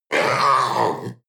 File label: panther young roar short